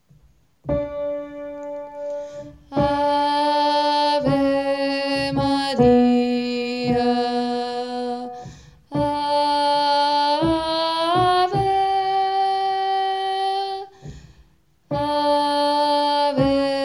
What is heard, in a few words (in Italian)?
ave maria verbum panis contralti